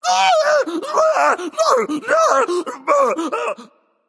fear, voicepack; smite; jorm

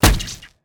whip hit; smite; osiris

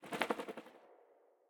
gugnir feathers; smite; god odin

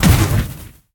impact fire; smite; god merlin

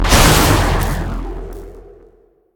Hit; smite; raijin

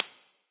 Clap; smite; god susano

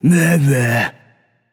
nv tongue; smite; voicepack; hachiman

